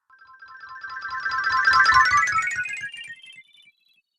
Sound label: Magical Cartoon Spell Health